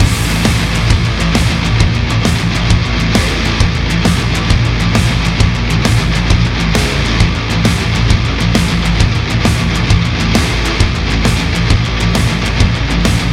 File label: Rock; Barotrauma; DynamicEuropa